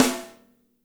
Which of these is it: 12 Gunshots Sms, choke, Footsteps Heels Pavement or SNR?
SNR